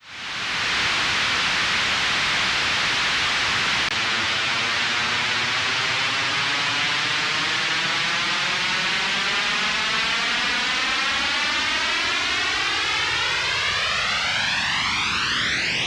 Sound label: noise